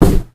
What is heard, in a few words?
cardboard box impact hard, physics; Source Engine; video game